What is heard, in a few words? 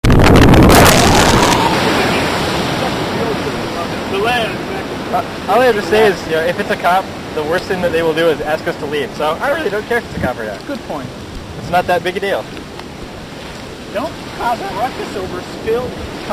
Ocean Echo Beach Chat